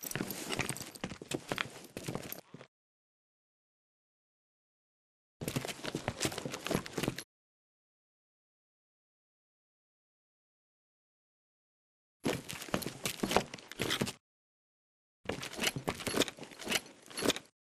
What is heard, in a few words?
soldier foley; Titanfall 2